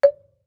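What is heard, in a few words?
Marimba deadstroke fortissimo Db4; MIS Pitches - 2014; Percussion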